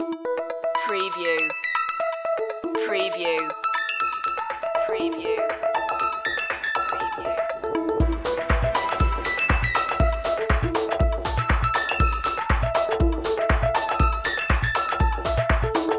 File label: groovy blip loop